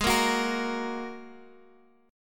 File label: G sharp 7th Suspended 2nd G sharp, down, Guitar, Drop D